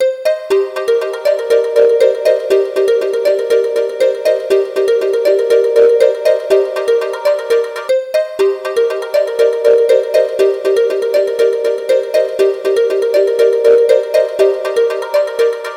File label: wild morning alarm